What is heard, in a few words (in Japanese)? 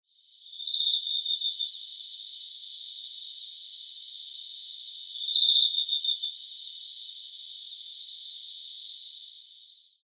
mushi aki; 秋の夜中に鳴く虫たち・環境音・10月版その1; 基本的に近所で録音した音です